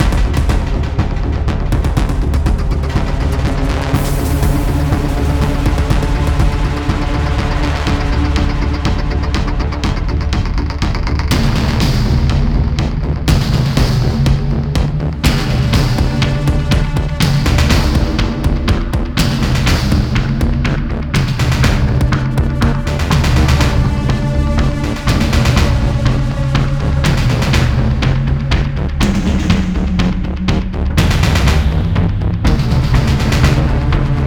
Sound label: pulse